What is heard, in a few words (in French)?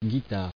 guitare